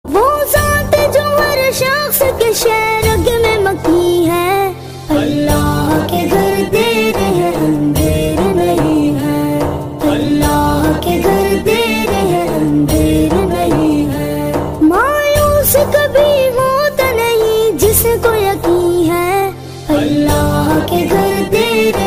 latest naat

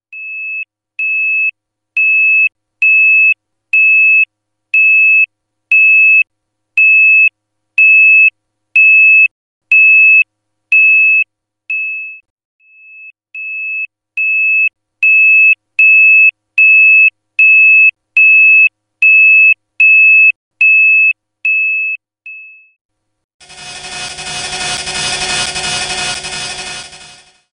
Iphone 5 Alarm

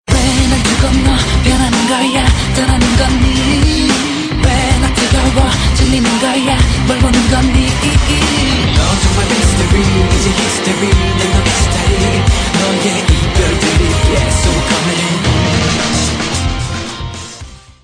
Level Up

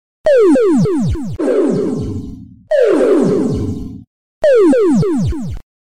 3d Hall S M S Tone

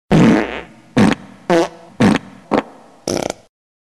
Fart Laud

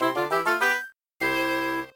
Mission Success

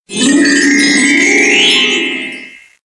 Warp Claw

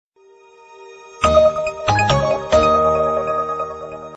Samsung Wave

